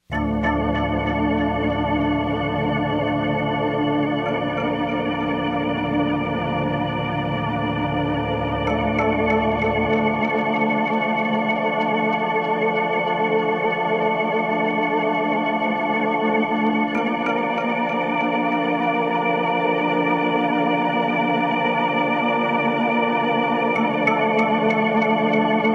organ build up

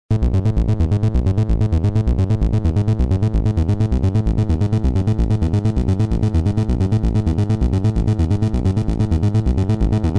analog synthesizers sequencers; dr zee workshop

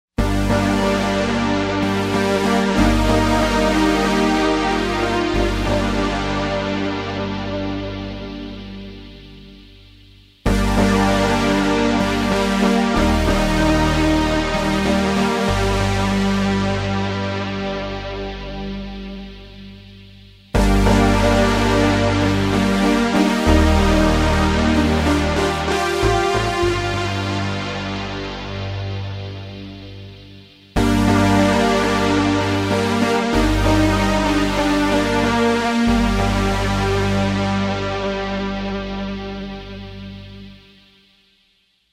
space synth

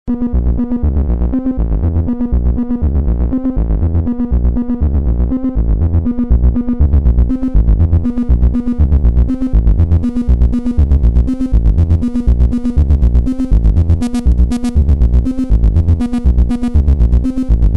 analog synthesizers sequencers; dr zee workshop

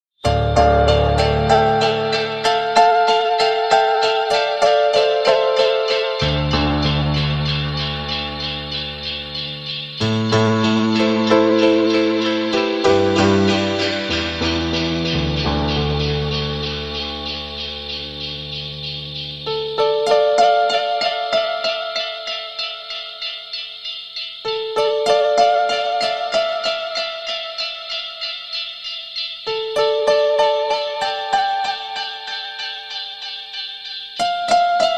rain piano

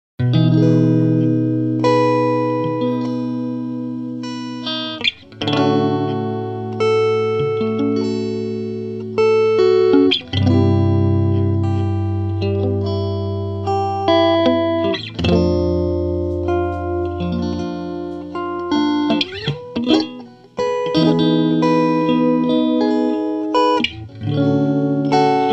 amp head super smooth clean; dr zee se cdc amp head

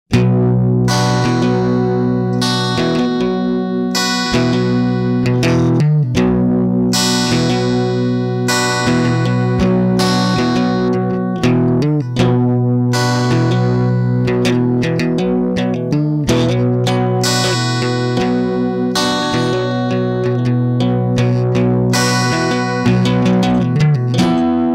slightly fuzzy; dr zee se cdc amp head

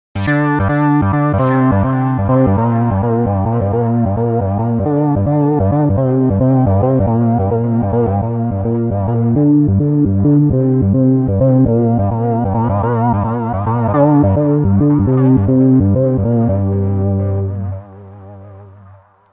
synbassriff

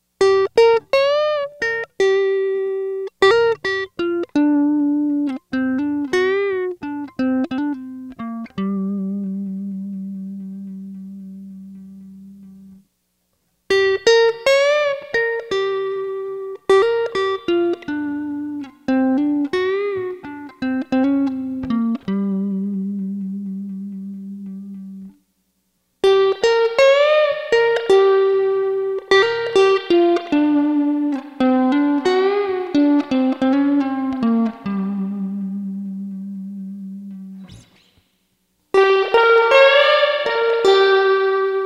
bogen mpr 6 guitar; dr zee workshop

